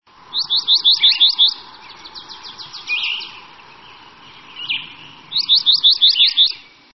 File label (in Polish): junko na tle innych ptaków